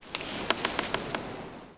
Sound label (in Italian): Albero, natura